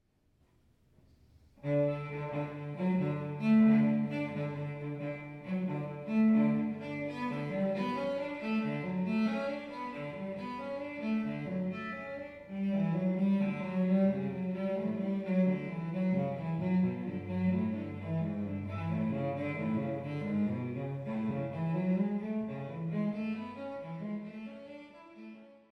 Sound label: Solo Cello; Prelude in D; Bach